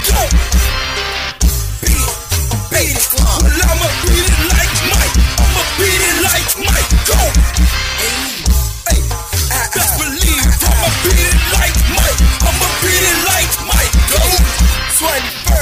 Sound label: Beat It Up